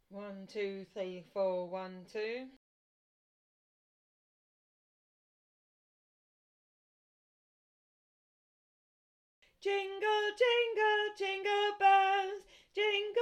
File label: SOPRANO